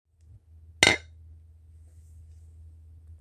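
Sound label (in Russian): ударение бутылок друг об друга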